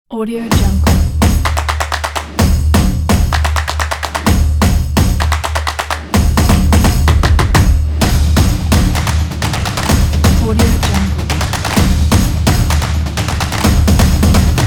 a drum

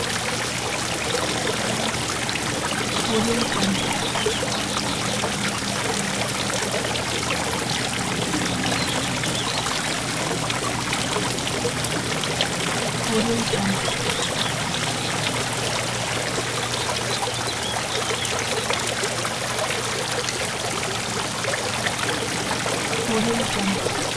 water fountain

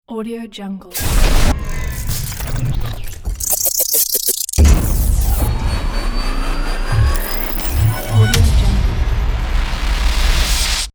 transforming logo

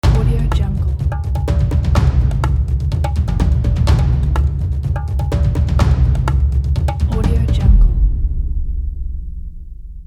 war drums introduction

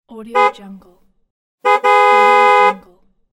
car horns

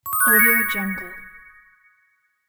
magic fairy spell

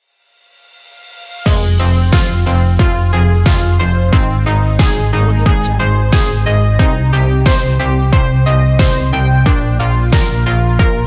synthwave

